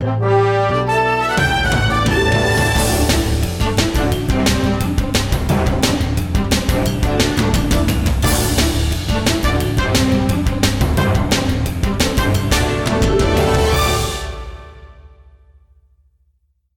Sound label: Promo